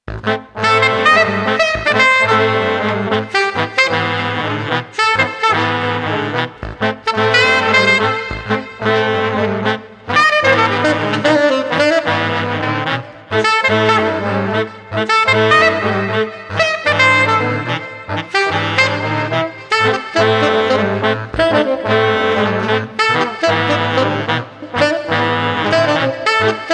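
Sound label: Street Brass Swung Funky no Drums, 110 bpm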